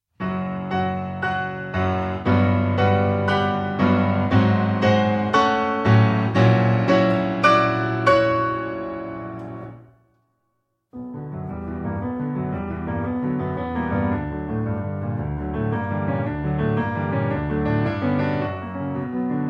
Piano Sonata No. 3 in B Minor Finale. Presto non tanto